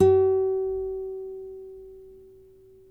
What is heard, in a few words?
NYL NV G#, nylon no vib guitars; Spectrasonics; Hans Zimmer Guitars VOL-1